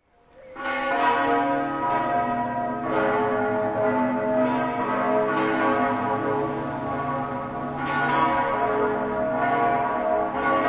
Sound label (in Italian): tricesimo, campane